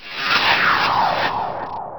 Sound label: swoosh delay